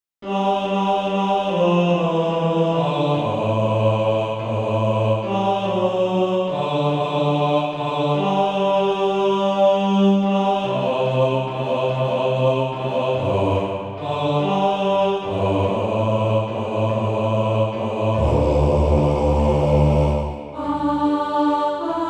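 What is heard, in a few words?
bass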